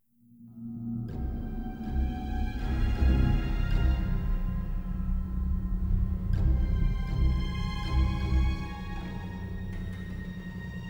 Apartments Suspense; Timeline

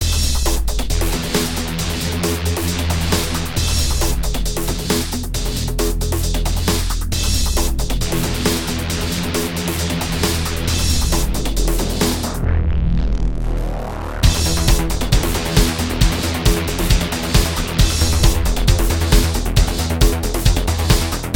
Destroy; Stormrise

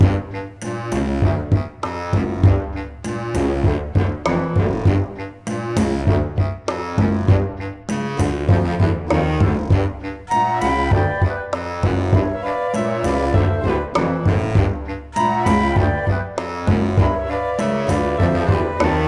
Tiki village loop

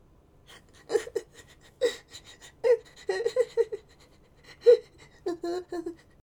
Male Idle

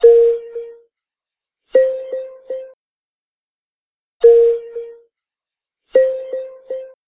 Gamepad Sound Effects; wii u system audio

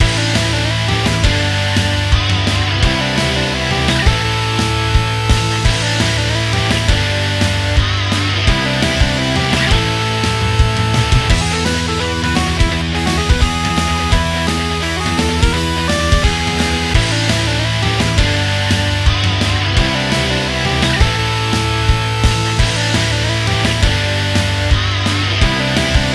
3d Music

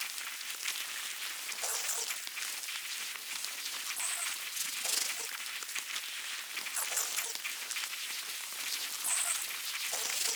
deepnest insect floor loop

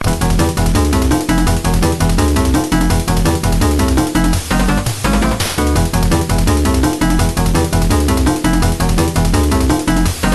Quick Multiball Prelaunch, pinball; addams family the bally

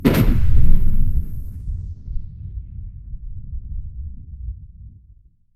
battle cannon shot; a dump in the dark

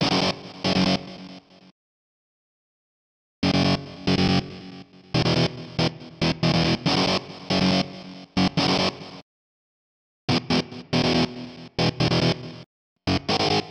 Transition Engineering Gtr F#; Bust n Rush

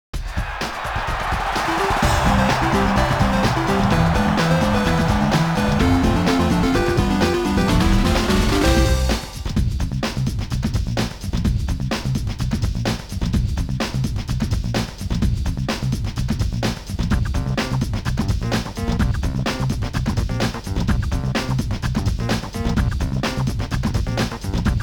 Victory Celebration; Excitebike 64; Nintendo 64

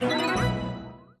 Goal Completed; Monster Life

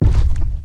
Boss Footstep

